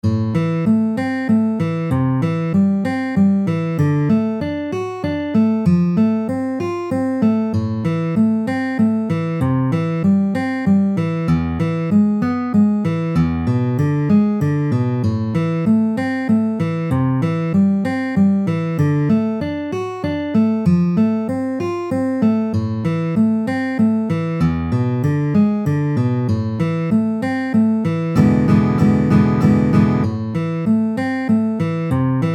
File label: Triplet arpeggios, guitar